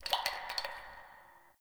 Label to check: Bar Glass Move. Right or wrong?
Right